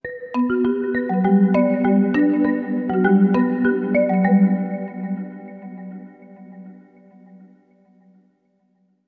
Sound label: Bells